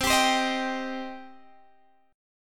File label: C Minor, C, Guitar, Half Step Down